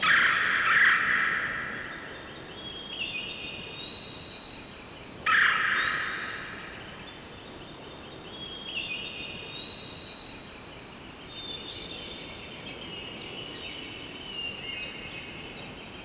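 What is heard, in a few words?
forest ambience reverb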